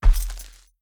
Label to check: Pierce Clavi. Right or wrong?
Wrong